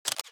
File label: Camera shot; Samsung Galaxy S2